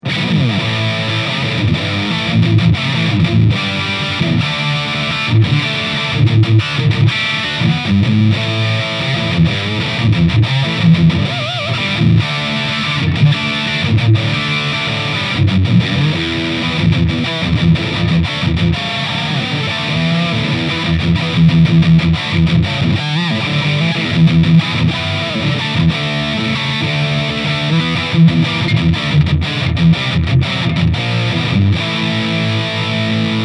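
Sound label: High Gain Bridge Pickups, guitar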